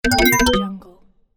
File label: short slot game collect